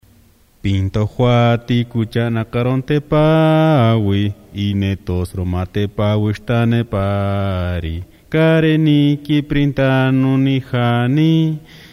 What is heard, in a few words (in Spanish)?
himno nacional